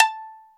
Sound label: Shamisen A4; Planet Earth; Digital Sound Factory Planet Earth